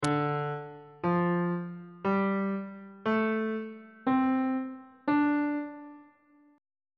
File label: d minor pentatonic scale on bass clef